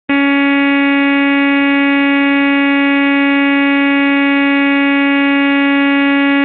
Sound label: wire alarm